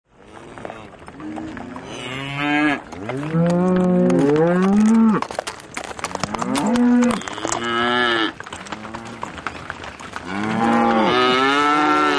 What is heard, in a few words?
Cows Mooing Trotting